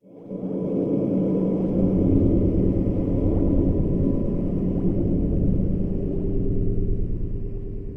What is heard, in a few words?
Hourglass Texture, Drone Lab; Instruments; Laboratory